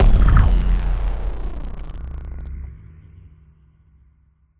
Impact Boom Distorted; bottlerocket

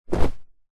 foley clothing shorts thick cotton drop carpet

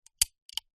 leisure toy cap gun dry fire

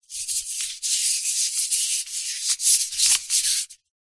household aerosol deodorant can handling; zapsplat

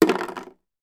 toy stickle brick put in plastic tub; sound effects one

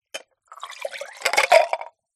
food drink cola pour glass ice hold hand movement

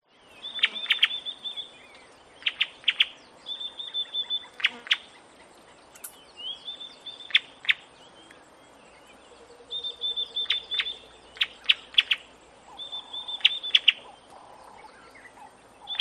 Bird Warbler; audio hero

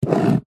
office handwriting pen draw line on cardboard box

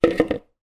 household bucket plastic small down on hard floor; zapsplat

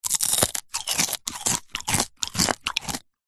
human eating crunching cereal bran flake

